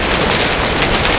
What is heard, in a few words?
Rain; Authorware Professional for Mac